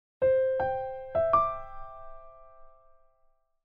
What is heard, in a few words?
Piano Version of the Melody